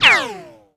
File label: whine, weapons